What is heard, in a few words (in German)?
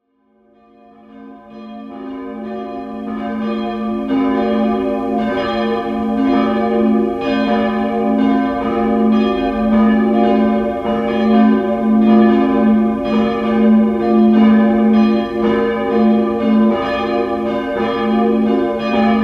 Geläut Dorfkirche; Kirchen, Gemeindehäuser, Geschichte